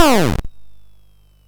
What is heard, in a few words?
Frantic, MOS6581R4